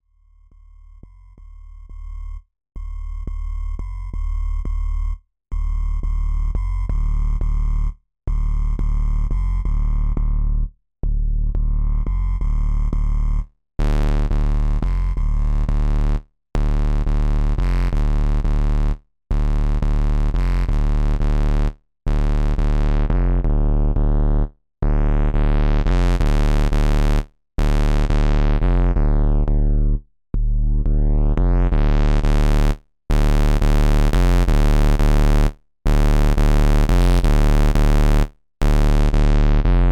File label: vcf1 Bass Line Distortion